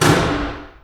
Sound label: Big Switch